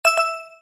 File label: bomb